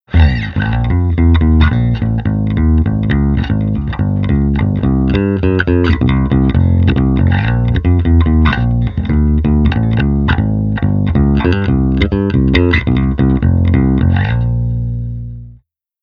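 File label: Bass Amp Sim Cabinet On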